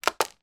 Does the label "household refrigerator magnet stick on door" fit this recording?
Yes